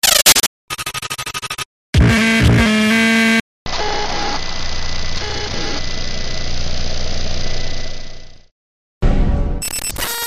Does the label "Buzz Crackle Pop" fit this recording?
Yes